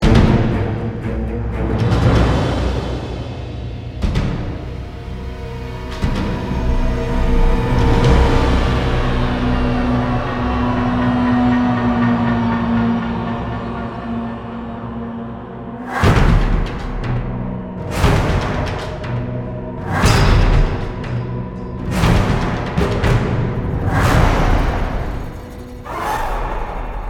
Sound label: Global Cave Combat Intensity